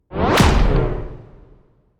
Boomy Fast Whooshes

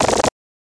Scratch

